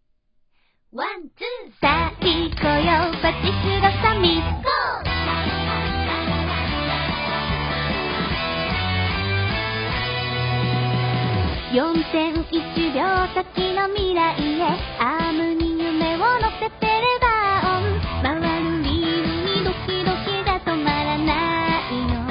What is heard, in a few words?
song; 公式ソング（ショートバージョン）